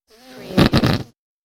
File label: Whoopee Farting Blowing Raspberry Sound